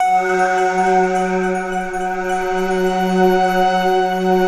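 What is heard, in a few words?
mystery pad, Stack; Optical Media International; Sonic Images Library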